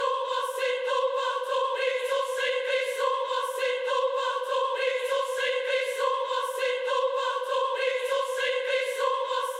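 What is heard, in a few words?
Staccato Choir In C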